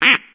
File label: bumper car quack; Source Engine; tf